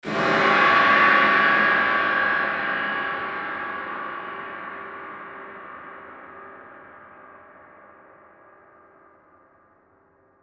Hit Gong Reverb, Hit Gong Reverb Ring; Blastwave

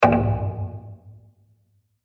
Deep Wood Hit With Reverb; Bursts; Impacts

